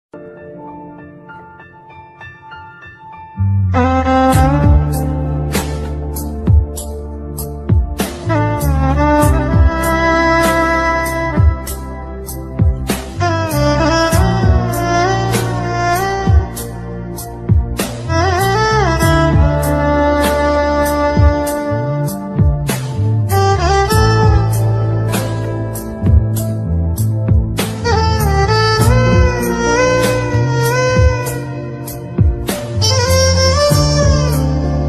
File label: evergreen new malayalam ringtones love bgm tune mobile ringtone